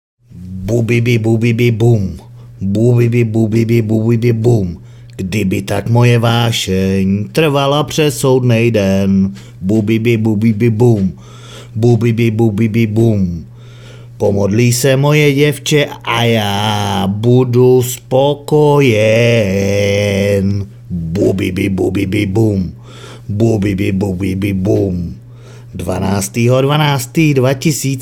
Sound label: blues